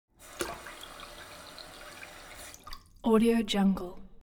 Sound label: elements water sink fill splatter medium short; smartsoundfx